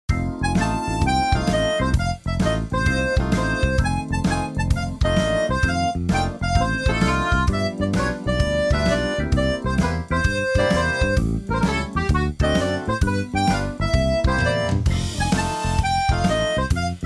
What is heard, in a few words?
beach Shuffle